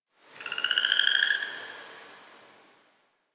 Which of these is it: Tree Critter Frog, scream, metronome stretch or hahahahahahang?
Tree Critter Frog